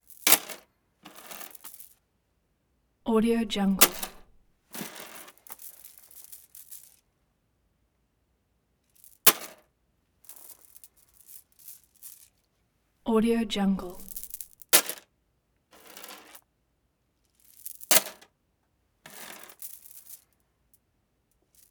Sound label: beads pick up; prosoundfx